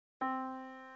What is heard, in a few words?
yamaha Disk